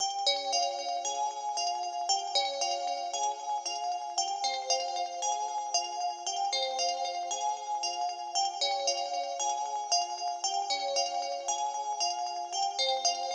melo loop, 808; saikik nomore

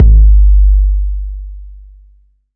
808's

